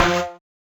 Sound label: marimba steeldrum; ゆめ2っき